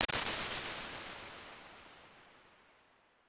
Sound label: large wide echo hall; IR